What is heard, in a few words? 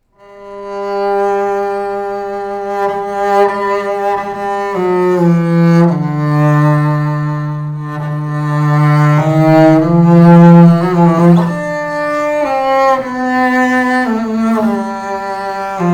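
antique double bass sound sample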